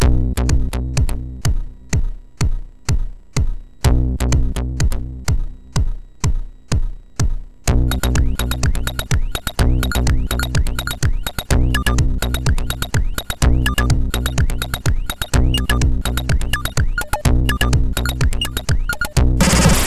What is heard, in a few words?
Techno House, MOS6581R2; hvsc